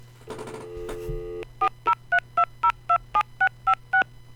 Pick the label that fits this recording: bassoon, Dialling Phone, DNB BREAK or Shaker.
Dialling Phone